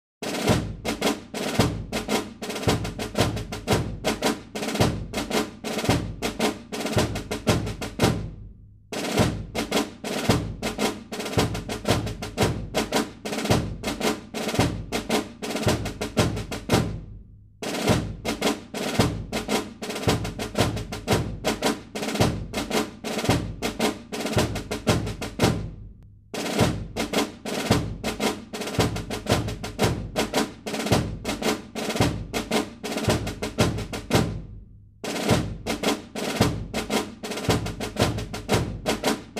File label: drum cadence